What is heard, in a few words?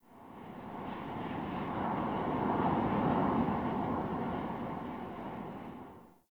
Under City Wind Heavy Slow Gust